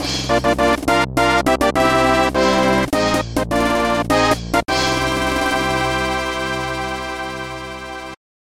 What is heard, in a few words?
Bowser Clear; SMW2YI